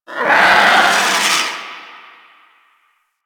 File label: Drifter Camp Vulture; Ambience; One Offs; Birds; Warframe; Quests; The New War